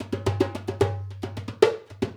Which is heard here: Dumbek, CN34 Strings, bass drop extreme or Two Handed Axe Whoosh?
Dumbek